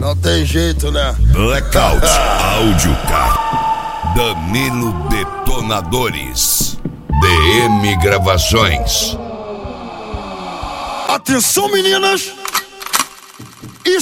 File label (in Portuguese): funk house remix